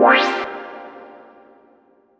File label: Stab C3; 90 sSampleCDs; Club Techno